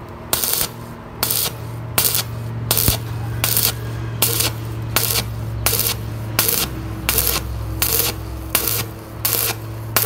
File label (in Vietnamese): tiếng máy hàn cơ khí kêu